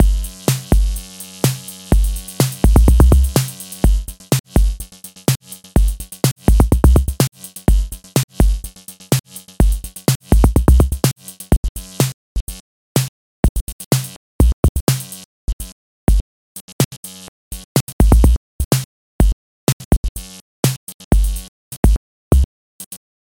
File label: Electronic Drums; Inertia